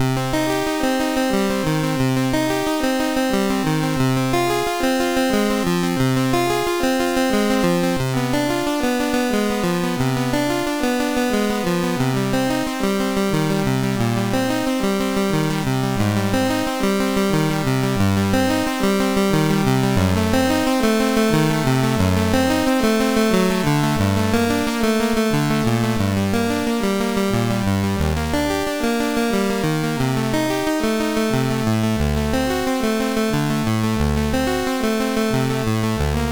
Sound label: Prelude in C Minor, MOS6581; Syl